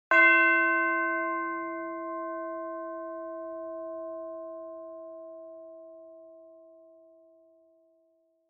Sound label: Orchestral chimes single sus